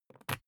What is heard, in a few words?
briefcase plastic latch lock key pull out; industrie wirtschaft; bueroarbeit